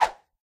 minion sword; smite; npc; roman archer

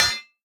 minion battle foley metal hit; smite; npc; roman archer

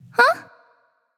confused; smite; amaterasu

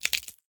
idle knuckle crack; smite; kuzenbo

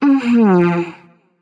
disagree light; smite; voicepack; hel

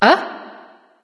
nv surprise; bellona

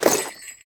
armor heavy; smite; chiron

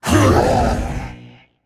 grunt attack, voicepack; smite; jorm

